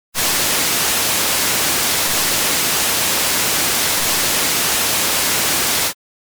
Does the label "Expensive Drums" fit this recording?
Yes